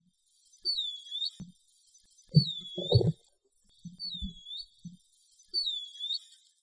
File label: Hydropsalis longirostris Bacurau da telha, Sana Peito do Pombo; Macaé RJ